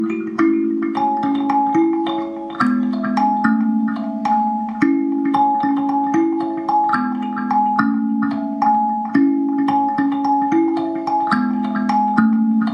kalimba

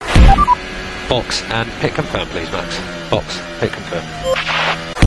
confirm

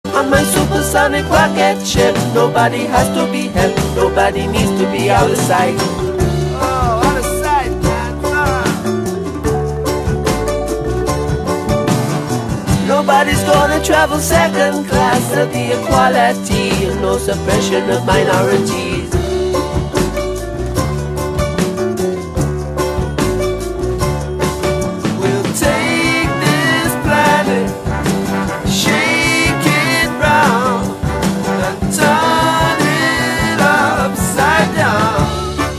ringtone

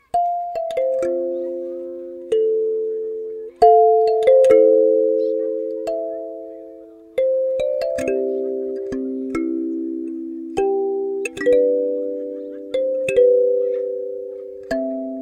kalimba cover

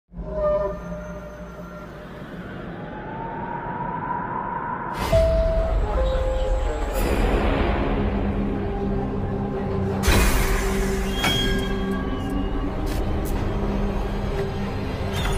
Ringtone; Scream 6

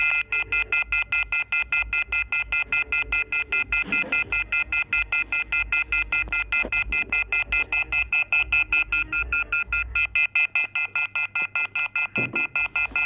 Dial Tone

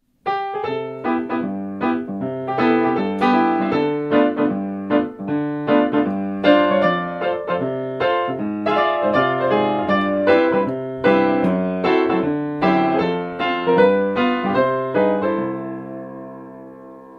on the Piano

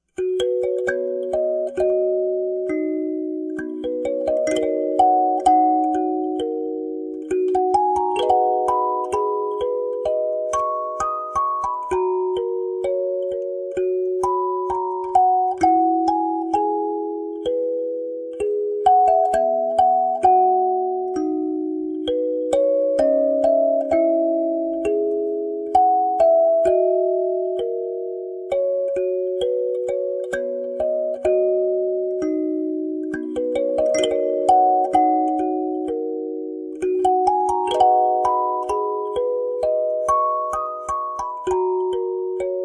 kalimba cover

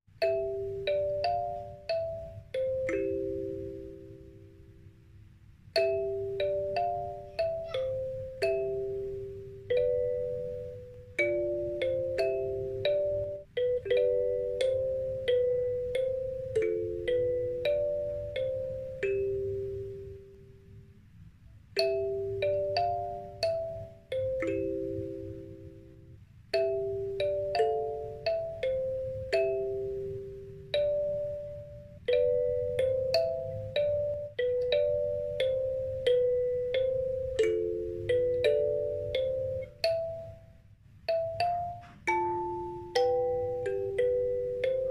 kalimba cover; aladdin ost

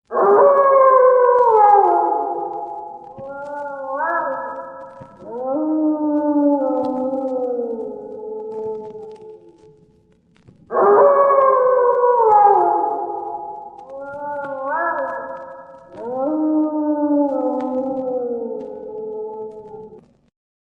dog howling ringtone

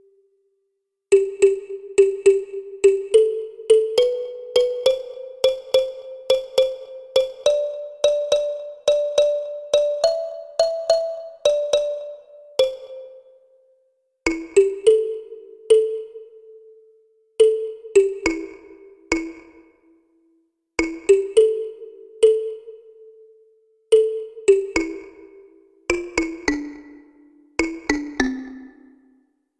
hallelujah kalimba

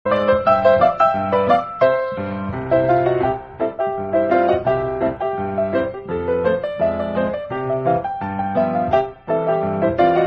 Piano; Charlie Chaplin

